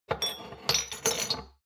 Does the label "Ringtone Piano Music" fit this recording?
No